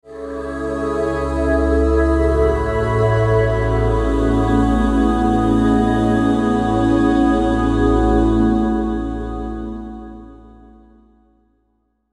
Musical Vocal, Musical Vocal Airy Sweeper Swells Low; Musical Sound Design; Blastwave